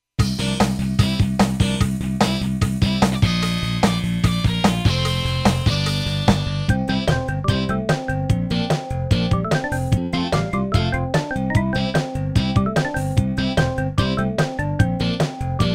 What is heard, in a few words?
style 8 beat Up Tempo